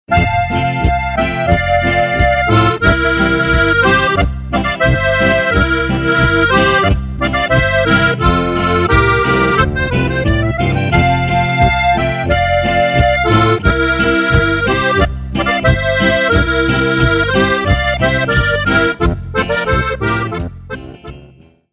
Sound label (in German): Harfenboarischer